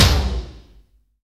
TOM D, Drum Dry; East Collexion; Drum 1

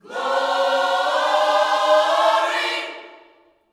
GLORY, gospel; Spectrasonics; Vocal Planet; GOSP PHR

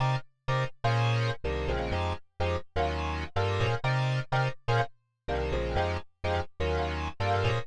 125 Stabs housey chords C; Keys; PowerFX - Nu Jazz House

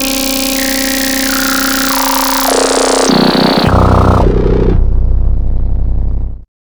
CYBERGUN L, Hard & Loud Techno; USB Soundscan vol.01; AKAI

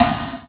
SN Dirty; EMU Orbit-3

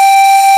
PIPE G3, SYNTHESIZER; STACK SYN #2

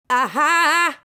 "Ahaaa", vocal phrases; Ultimate Vocals

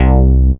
ACC BS C3; Q Up Arts; Dream Experience

